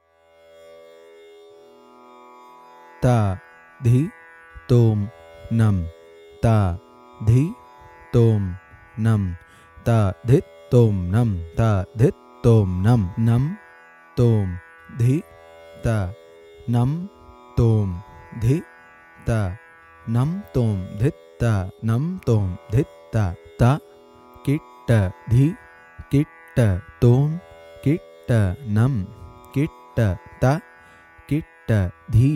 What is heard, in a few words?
Carnatic Violin Reading Skill; Grade 2; SaPa